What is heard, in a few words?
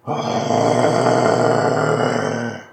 idle, male zombie; nmrih; zerosiki; slender